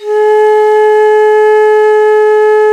VENU G#3, Winds; E-MU Producer Series Vol. 7 – Old World Instruments

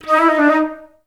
FL ORNAMI D#3; Best Service; Peter Siedlaczek's Advanced Orchestra

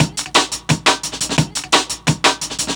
DNB BREAK

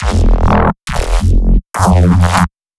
reese

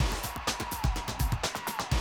125, Classic Drum Loops

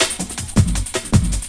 LOOP SD, Drum & Bass, 160 BPM

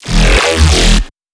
Neuro, bass; Old Billain

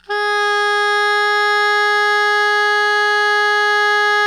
Soprano MF G#3, Saxophones; Greytsounds; Philip Wolfe David Crozier's Saxophones

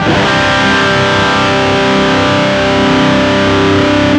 C Chord, Guitars

